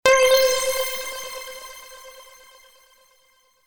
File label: Power Up